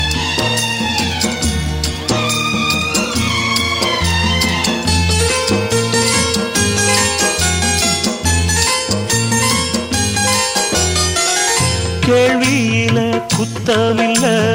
tamil mix ringtone

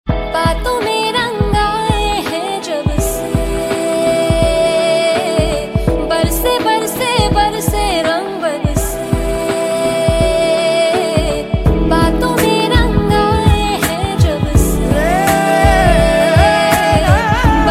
sad indian music ringtone

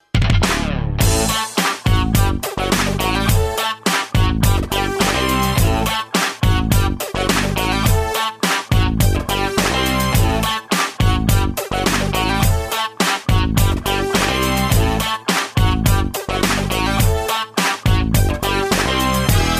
funky ringtone; galaxy

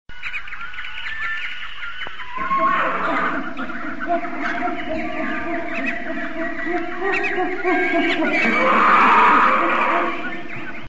forest animals nature sound tone ringtone

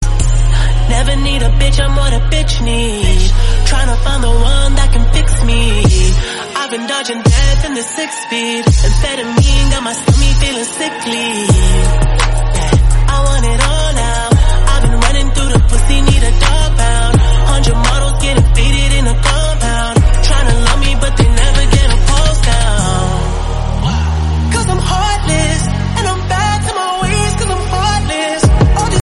romantic call tone ringtone

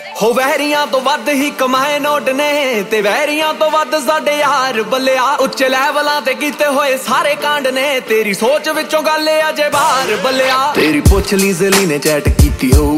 punjabi ringtone

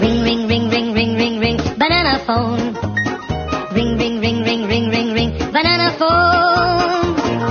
banana message tone ringtone

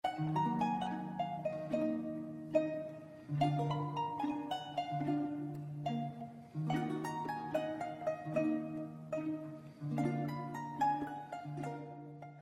slow sound ringtone

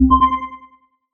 sms tone ringtone; nexus 5

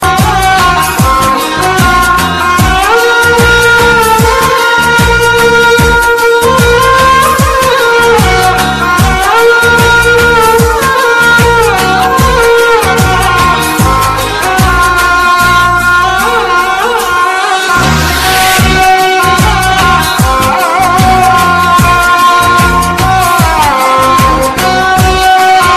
sad music ringtone

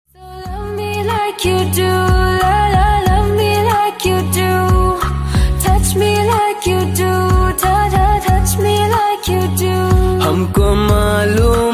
love romantic ringtone

